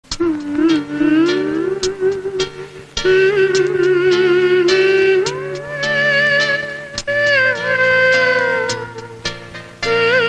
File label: climax bgm malayalam; vandanam